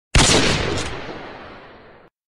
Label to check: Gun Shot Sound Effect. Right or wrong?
Right